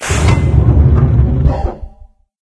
blast door close; video games; ambient; source engine; portal2; outland

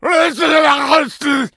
shout, infected

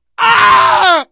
hurt critical, survivor voice; source engine; left 4 dead 2; manager

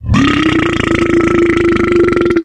boomer dive, fall

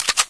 mp5 slide back; Source Engine; cstrike; weapons; mp5navy

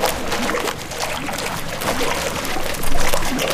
paint blob splat

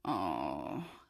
moan, female; Source Engine; video game; npc

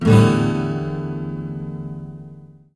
guitar strum; tf

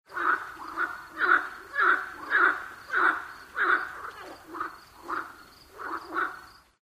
animal call frog; Source Engine; tf; lair

